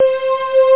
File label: string